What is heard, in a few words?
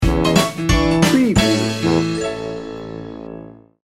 ragtime sting bumper